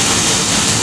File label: laser fly